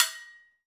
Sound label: Fijidera; Club-50 - Foundations Roland; Agogos